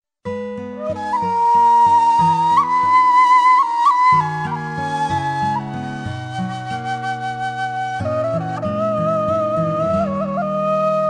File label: Armenian Traditional